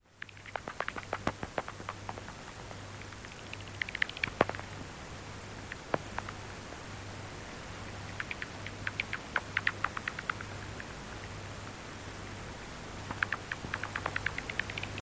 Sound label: P pipistrellus, Correze; juillet 1991